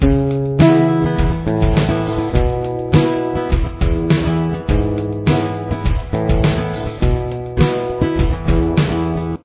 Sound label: crash